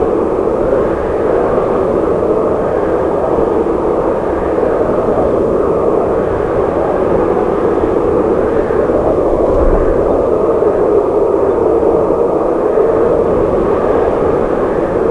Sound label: summers wind